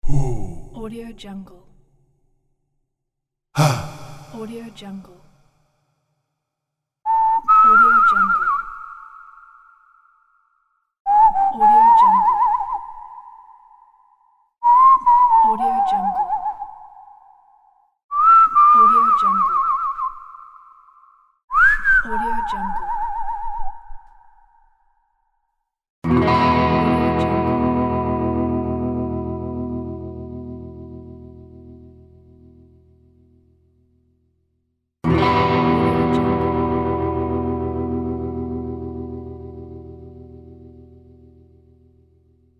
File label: spaghetti western mega sfx pack